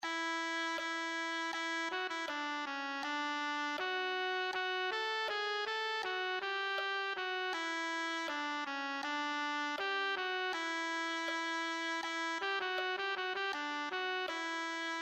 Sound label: tenor saxophone